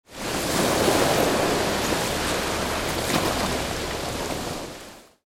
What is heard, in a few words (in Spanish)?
las cabras